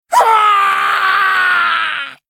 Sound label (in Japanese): 死亡; ビンセント